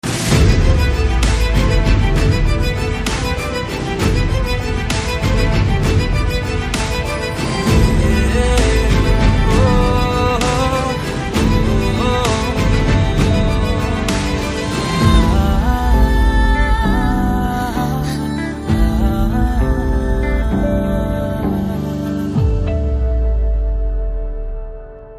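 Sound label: background music Theme Telugu Ringtone; Aswathama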